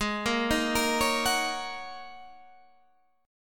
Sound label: G sharp 7th Suspended 2nd Suspended 4th G sharp Guitar Half Step Down